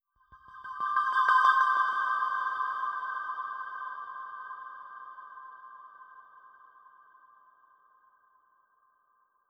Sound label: Bonus Atmo C; Vengeance Samples; Bonus Sounds